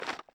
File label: put In Bag